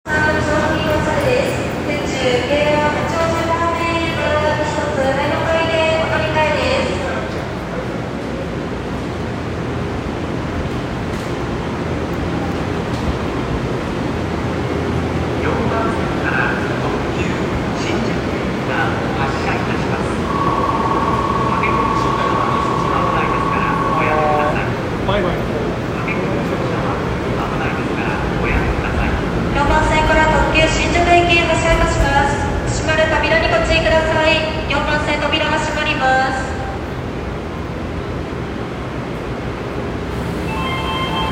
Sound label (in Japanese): 京王線調布東